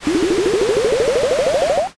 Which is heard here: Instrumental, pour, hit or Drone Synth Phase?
pour